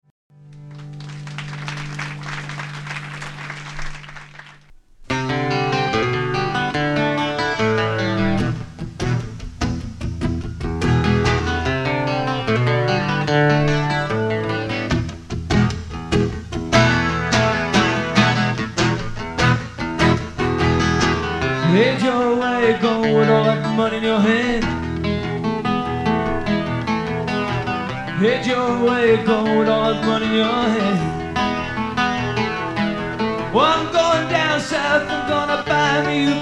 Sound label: - acoustic cover